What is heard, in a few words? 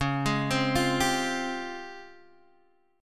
C sharp Major Sharp 11th, Guitar Open G